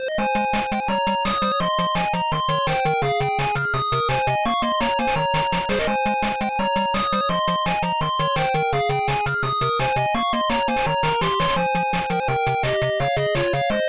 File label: Puzzle BGM; Pepe's Puzzles; Pico